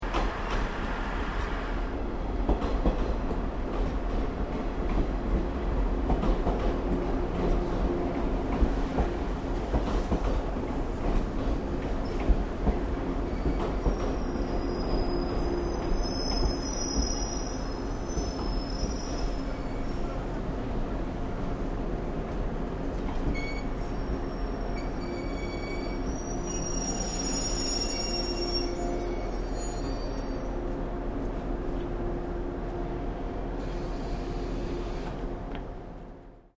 Train Aomori Series E731 Express External Stopping, Japanese Trains; Vehicles